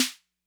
Snare Accent Min Tone Max Snap, 808; classic machines

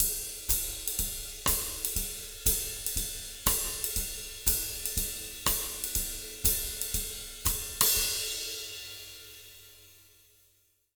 120 BBAND, Jazz Latin Drumloops; USB Soundscan vol.08; AKAI

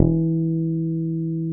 P-BASS E1; Sonic Reality - Vintage Timetraveler

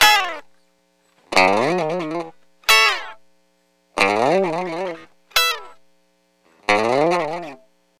Lounge Gtr-E Dry; Zero-G Kocktail Kollection; Construction Kitz (Lounge); Welk Lounge 090

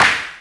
JD800 Internal Drums, Roland JD800

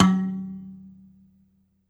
BASS KALIM, Kalimbas; E-MU Producer Series Vol. 7 – Old World Instruments

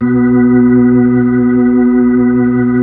B-3, Keyboards

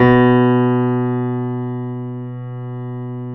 B 1, Keyboards Acoustic, GRANDP ST; USB Soundscan vol.17

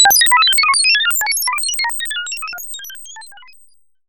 sci fi; Masterbits - Switch FX

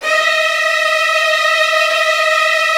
VN TRILLS, Virtuoso Strings

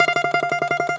DOMRA, Russian Strings

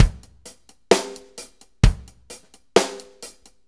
4-4 Loop, Drum Loops, Full Kit Loops; Drum Tools

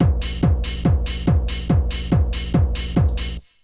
Essential beats; FM 57 - The Ultimate Sample Collection (1997); Repedative Nature(142), Psycho(140), Crowded(140)

